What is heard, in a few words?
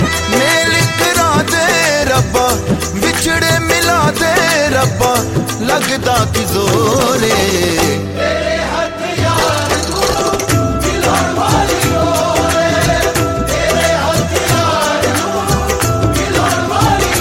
Sad Punjabi Ringtone